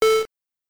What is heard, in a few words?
bip